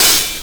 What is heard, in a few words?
HH OPEN; USB Soundscan vol.27; Ragga & Dub Reggae; AKAI